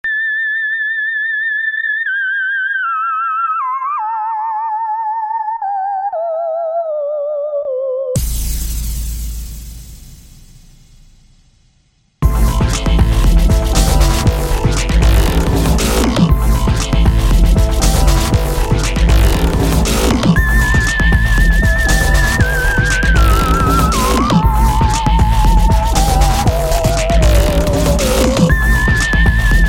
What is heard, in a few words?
whale whistle ringtone; apple